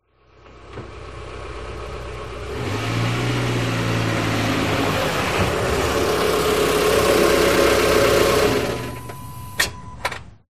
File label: car arriving